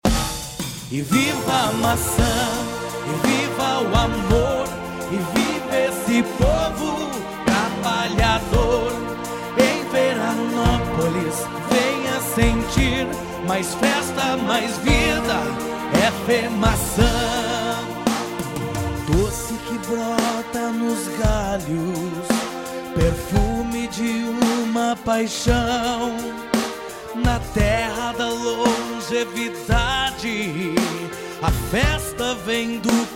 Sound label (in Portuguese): hino; femaca